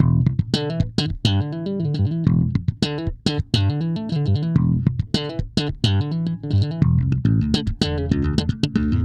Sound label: 106 Pop Slap Bass Emaj; Pop Rock; E-Bass; Pro Tools Essential Loops; Digidesign